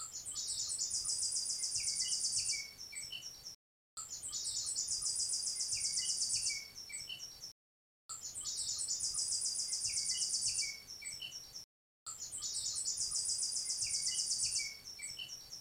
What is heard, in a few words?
American Robin, Turdus migratorius; Aves